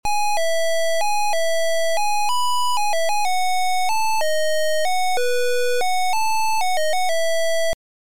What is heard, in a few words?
MELODY; beeper